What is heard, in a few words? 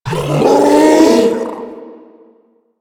Infested Ancient Strike Scream; Warframe